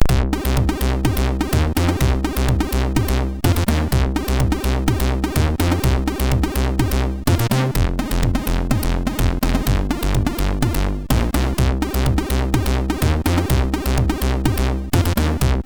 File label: Intro, sid MOS6581R3; Brainbombs Intro